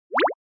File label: Motion Pop